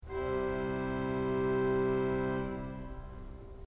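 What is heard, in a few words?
grossgedakt 8 C, organ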